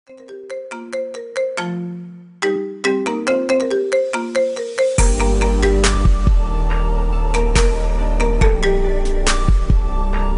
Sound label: marimba ringtone remix